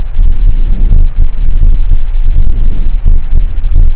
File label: breath